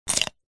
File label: human eat bite carrot raw crunch single; zapsplat